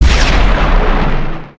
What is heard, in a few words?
Dark Thunder; Sorcerer